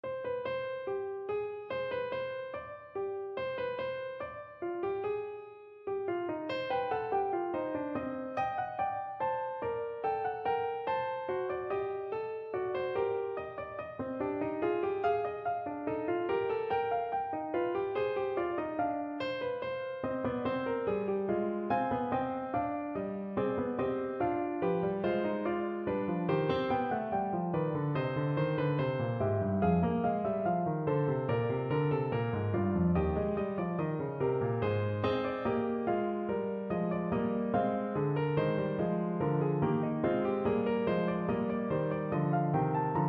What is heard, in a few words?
C Minor Fugue, piano; Bach